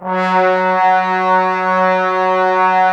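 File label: brass trombones F#, Woodwind Brass Ensemble; 90 s Sample CDs; Miroslav Vitous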